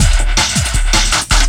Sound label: LOOP, 160 BPM, Drum & Bass